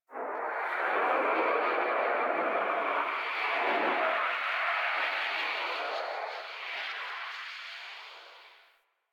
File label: Atmosphere Gust; One Offs; Warframe; Venus Open World; Venus Landscape